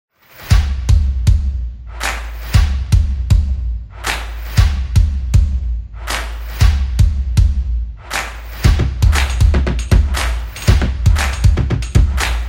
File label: Intro Stomp